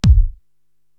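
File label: bass drum Crisp, Korg TR-Rack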